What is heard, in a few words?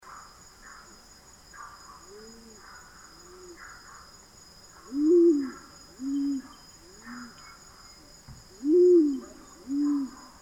Pale capped Pigeon Columba punicea, pale capped pigeons call